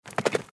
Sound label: Horse; Microsoft Studios; Age of Empires III - Complete Collection; cinematics